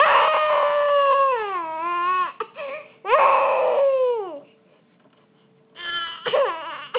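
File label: baby hungry, female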